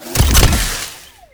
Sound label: charge boost